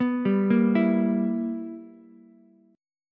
B Major 7th Suspended 4th Sharp 5th Ukulele, chord; BM7sus4#5; arpeggiated